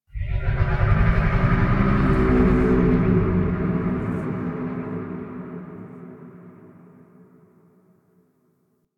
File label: Moan Exterior, Ambience